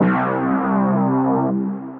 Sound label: Pad; Ovina Pad; Ableton; Skitter and Step